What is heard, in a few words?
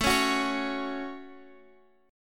A 6th, Guitar Open F, chord; A6 strummed; 21,20,18,20,x,x